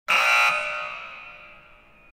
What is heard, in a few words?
incorrect buzzer sound; Snaparazzi